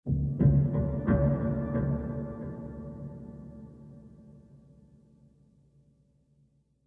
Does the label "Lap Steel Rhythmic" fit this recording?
Yes